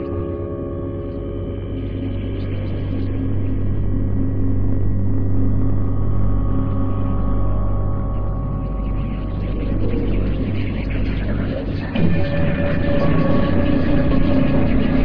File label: deads background; quake2; spawngirlsounds